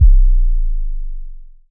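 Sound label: kick, ARP 2600 Drums; Polyend; Simulacrum Sounds; 1 Shots; Synths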